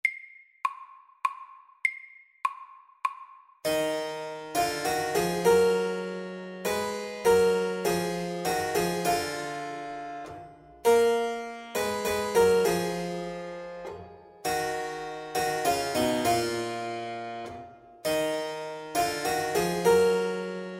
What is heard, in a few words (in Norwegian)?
Metal minuet SAKTE